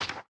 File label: planner inventory move; factorio 2.0.55